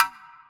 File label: Wood, Block Stick; Equipped Music; Loungin House